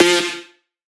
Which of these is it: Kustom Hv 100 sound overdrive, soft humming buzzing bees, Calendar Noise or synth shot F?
synth shot F